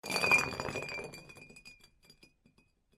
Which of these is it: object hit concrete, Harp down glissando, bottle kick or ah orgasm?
bottle kick